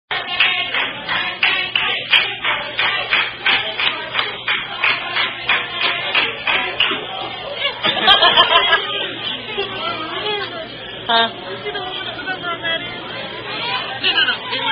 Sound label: Happy Happy Birthday